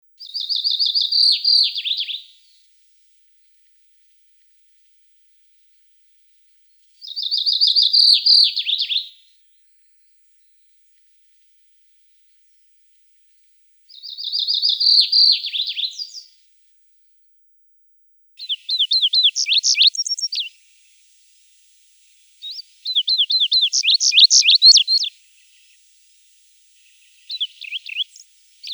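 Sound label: chestnut bunting; Ovsjanka ryzhaja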